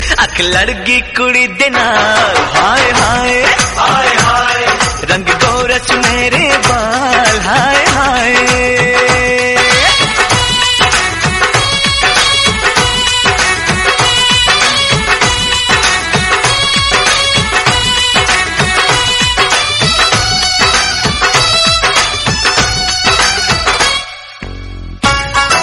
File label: bollywood musical tone ringtone